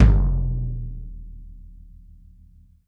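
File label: bass drum open; E-MU Producer Series Vol. 7 – Old World Instruments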